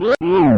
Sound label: boss Hit; N64; YOSHISISLAND